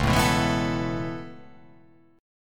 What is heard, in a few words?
C sharp Minor 7th Sharp 5th